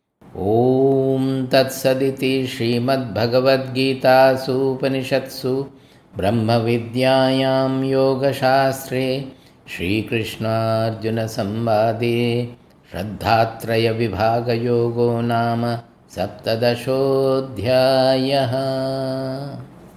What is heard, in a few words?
Ending Chanting; chapter 17 sraddha traya vibhaga yoga; bhagavad gita; conclusion